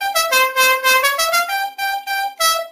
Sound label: Dixie Sounds Car Horn; Dukes